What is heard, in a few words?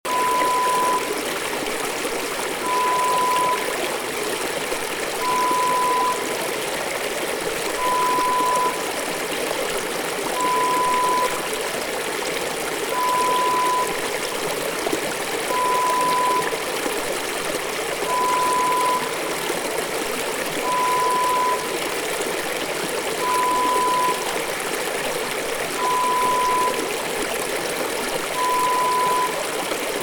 Babbling brook